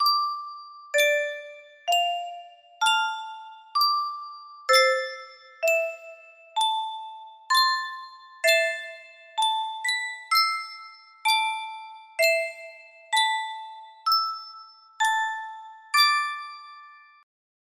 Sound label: Yunsheng music box; lol tales of the rift